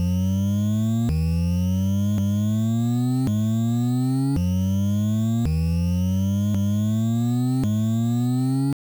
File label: 8bit